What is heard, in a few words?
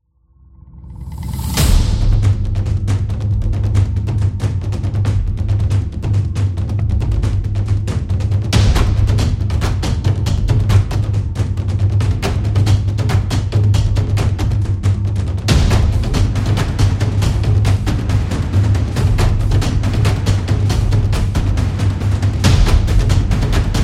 Epic Drums